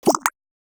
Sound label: Double Jump